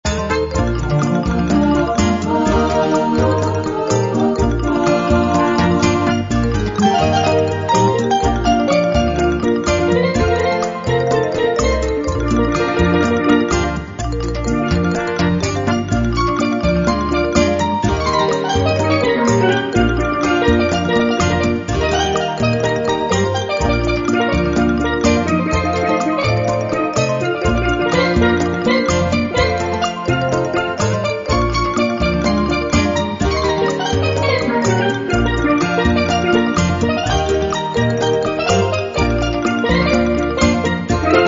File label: Calypso Harmony